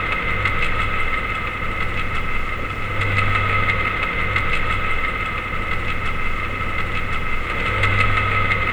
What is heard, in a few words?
Percscape; Sonic Foundry (Sony Creative Software); Ma Ja Le; Soundworlds